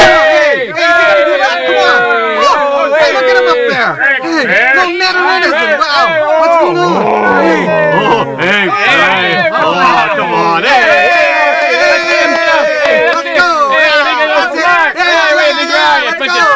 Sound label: Crowd Oohing; Human; Soundsation